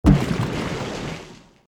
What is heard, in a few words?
explosion water; go diego go; safari